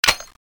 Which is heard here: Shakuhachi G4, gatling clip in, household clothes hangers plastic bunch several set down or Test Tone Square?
gatling clip in